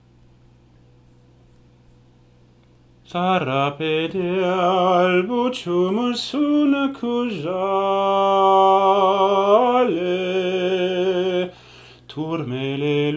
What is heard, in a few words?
soprani